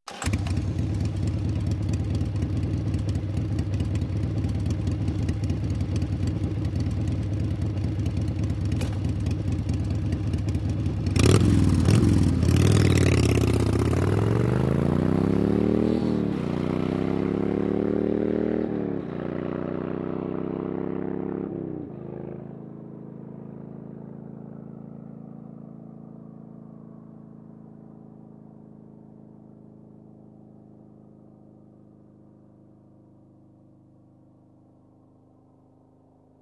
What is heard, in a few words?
Motorcycle starts, waits, drives away